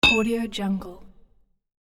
crowbar metal hit; hollywoodedge

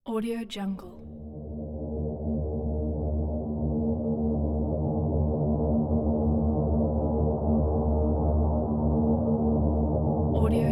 ambient drone

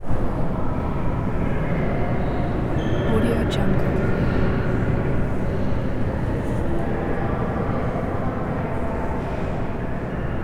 city ambience; prosoundfx

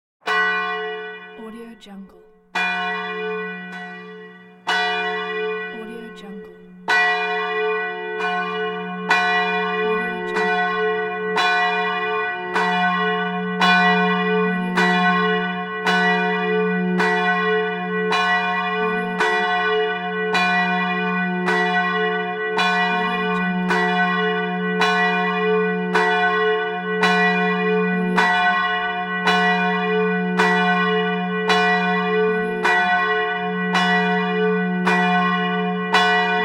medium church bell

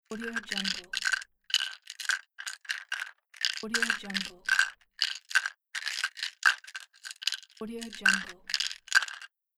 pill bottle

